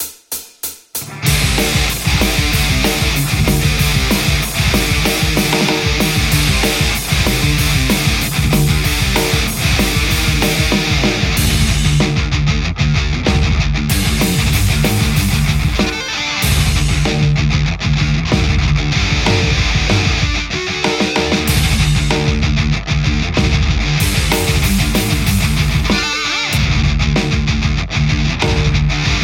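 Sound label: Telecaster; metal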